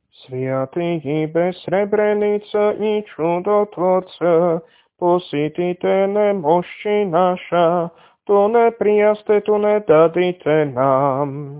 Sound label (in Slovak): Tropár hlas; Tropár nezištníkom a divotvorcom hlas 8; nedelna-skola